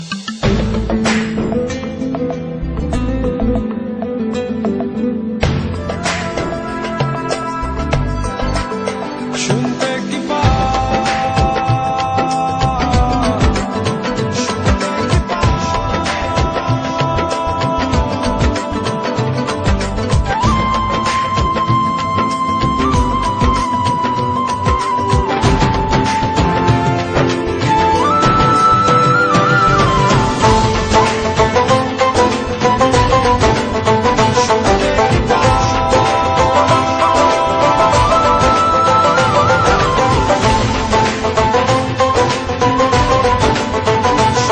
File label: Ringtone; বাংলালিংক